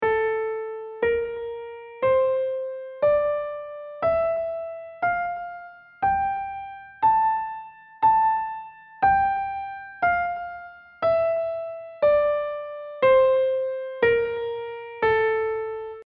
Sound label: Phrygian, ear tr; ear-training